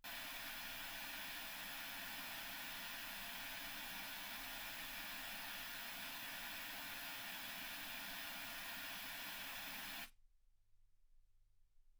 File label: wgn 48kHz